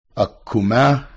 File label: male singer